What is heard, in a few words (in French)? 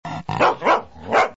chien, animaux nature